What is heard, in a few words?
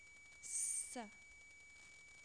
shh